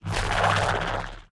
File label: Portal; peace death 2017 pc